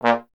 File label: Trombone A#0; Miroslav Vitous - Solo Instruments VOL-1; 90 s Sample CDs